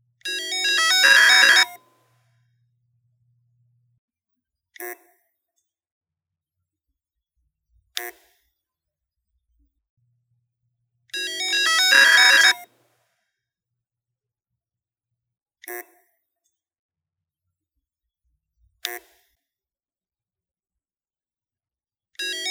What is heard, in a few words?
maxtor melody diamondmax 10 300gb